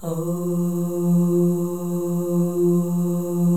OH, FEM, Symphony of Voices; Spectrasonics

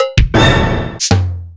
Korg M1, Samples Sliced; m8

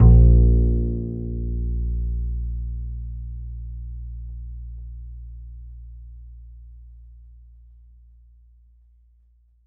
A, Upright Acoustic Bass; 1ST POSITION; Larry Seyer

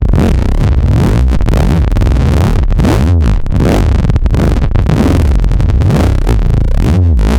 glitch; Phase Plant

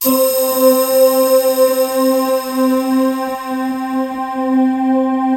ENSONIQ VFX ICE LAND; Rubber Chicken Software Sample Bank

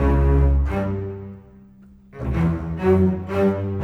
Bass Cello; Sonic Foundry (Sony Creative Software); Orchestral Series VOL-4; Rock and Pop; Rock-Pop 19

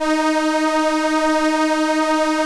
ARP STR ENSL, First Call Vintage Keyboards

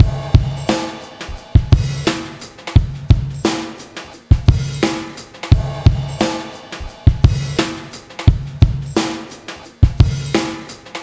Live Breaks, 87

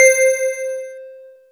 2600 TRIBELL, ARP, OLD SYNTHS; Rubber Chicken Software Sample Bank

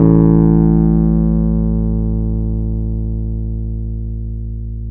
PNO, Wurly

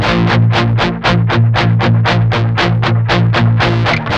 8TH B, Guitars; Spectrasonics; Hans Zimmer Guitars VOL-1; 128-JACKHAMR

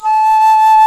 HRP RCDR&FLT

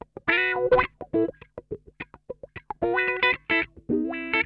LICK, Funk Guitar; 90 s Sample CDs; Zero G

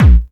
Bassdrums Hard, Hard Kicks; Vengeance - Essential Clubsounds Vol.2

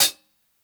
HH MED, HI HATS; Basement drums vol 2

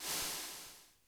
ROLL, BRUSH KITS; Crypton - DrumBase5000

